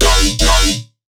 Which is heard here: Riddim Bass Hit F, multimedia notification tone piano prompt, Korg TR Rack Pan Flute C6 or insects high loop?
Riddim Bass Hit F